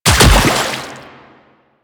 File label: Land Water, Footsteps; Warframe; Quests; The New War; Kahl